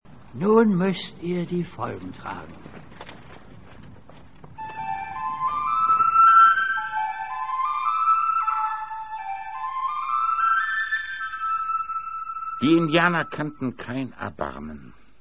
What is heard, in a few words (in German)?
floete